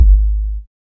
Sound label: roomy kick single hit g key, Royality free kickdrum tuned to the G note.